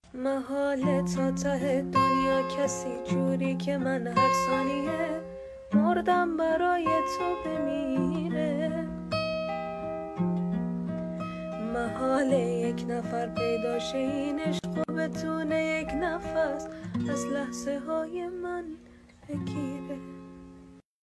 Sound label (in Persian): Sedaye Zan, صدای زن